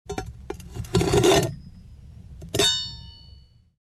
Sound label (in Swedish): metal, gnissel; METALL